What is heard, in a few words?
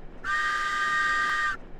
Whistle, Train; OpenBVE